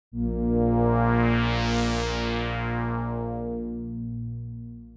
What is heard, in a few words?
Sweep; opxpro2; Riddle